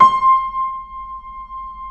Keyboards Acoustic, C5; USB Soundscan vol.17; AKAI

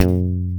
EG MHHP F1, GUITAR; AKAI S-Series CD-ROM Sound Library VOL-8

